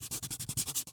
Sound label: drawing, dense, paper, cotton, eraser, rubbing, short, smooth; Eiravaein Sound